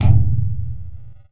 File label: hacking interface activate